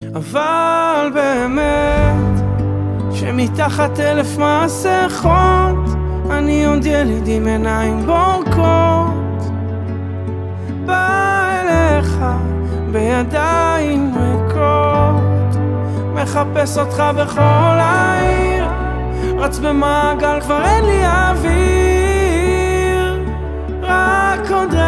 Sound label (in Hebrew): רינגטון